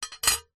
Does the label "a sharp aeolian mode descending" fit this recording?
No